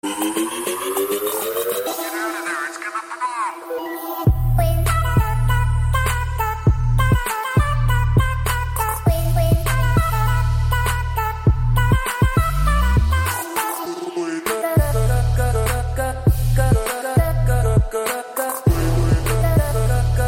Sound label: Trap, ringtones